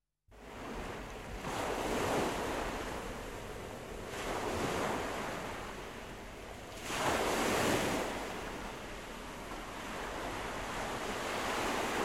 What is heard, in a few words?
Sea waves crashing on beach